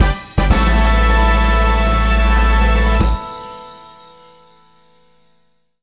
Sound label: Jingle Medal Get; Spooky Spirit Shooting Gallery